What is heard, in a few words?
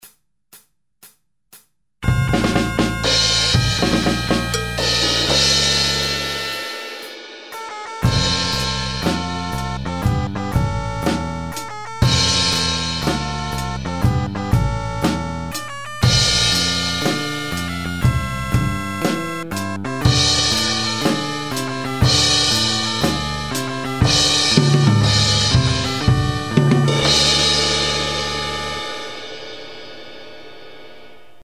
outro drum scratch